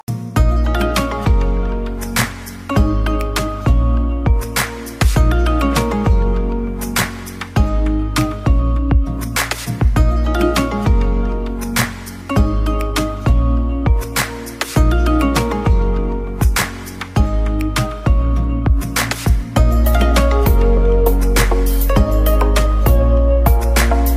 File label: Cool Ringtone